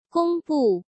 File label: gongbu